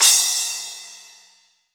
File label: crash cymbal, mono